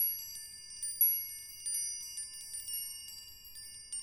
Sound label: chimes